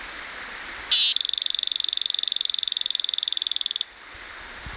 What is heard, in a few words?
Oreophryne meliades